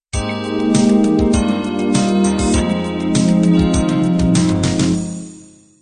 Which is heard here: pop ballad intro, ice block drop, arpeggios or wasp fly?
pop ballad intro